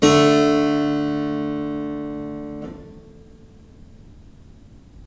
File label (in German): reine Quint, klang